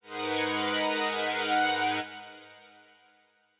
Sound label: synth pad before; nacre